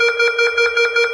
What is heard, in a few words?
warn